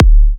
D kicks; markus hakala kicks

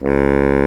BARI SAX C#2; Greytsounds VOL-1

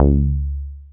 Bass SH-101

